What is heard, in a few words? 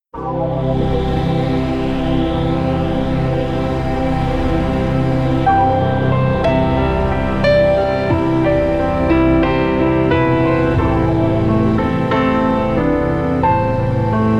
Ambient Trance 90 bpm